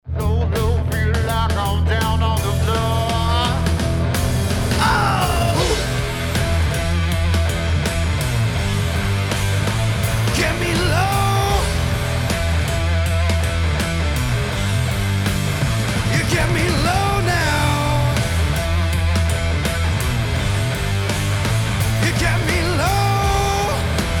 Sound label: Sample Rock Mixed